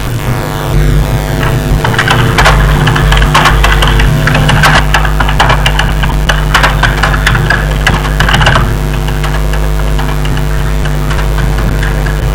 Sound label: keyboard logger